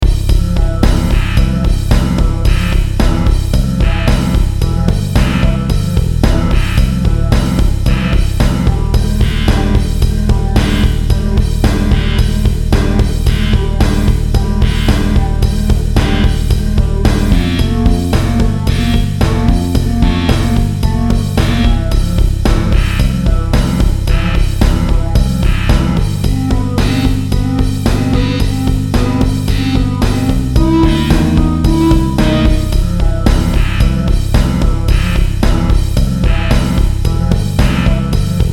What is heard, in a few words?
Heavy Metal